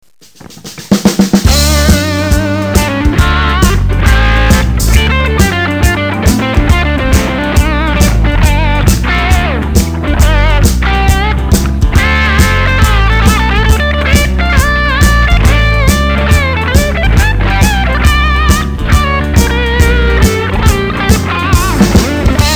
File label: christianblues